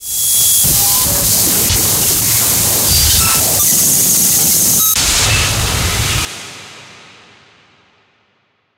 sonar Distortion; Barotrauma; Real Sonar

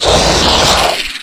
crawler death; Barotraumatic; Cthulhu; InsanityMonsters; Hallucination1

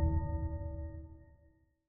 sonar Tail Suit Medium Beacon; Barotrauma; Real Sonar

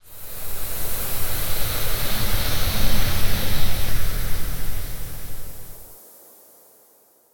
gas cloud; Barotraumatic; Thalamusk

